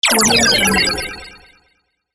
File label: computer debuff